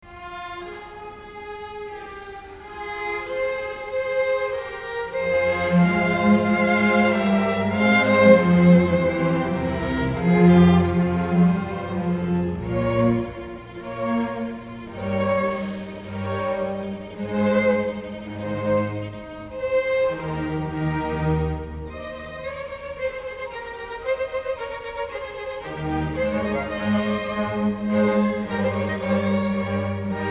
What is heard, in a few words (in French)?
Promenade en traineau 2e mvt